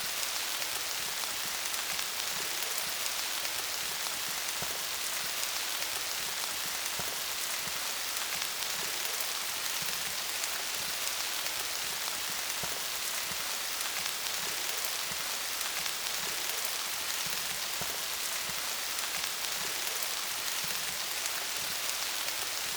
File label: Rain Sounds